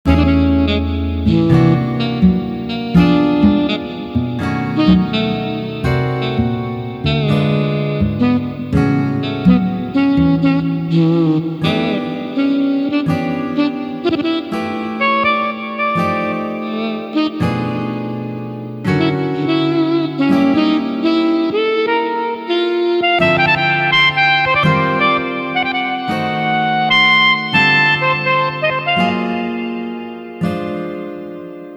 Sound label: jazz